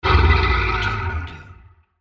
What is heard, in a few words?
Monster